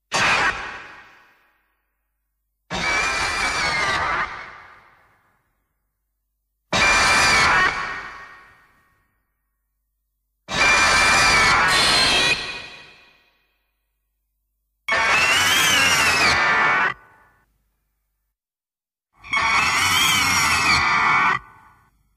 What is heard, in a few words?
Roar; Gigan 2004